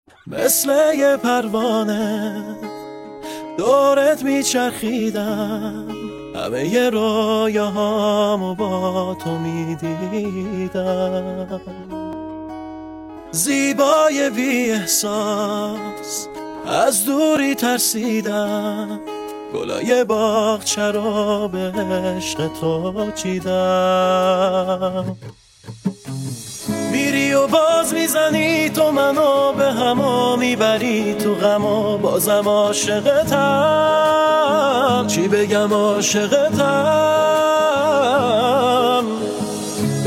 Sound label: Guitar